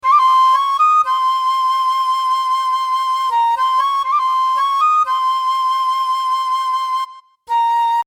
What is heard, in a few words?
Lead